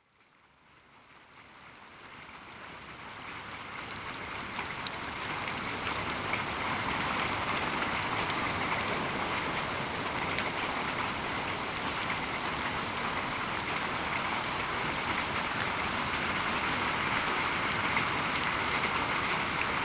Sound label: Rain Mix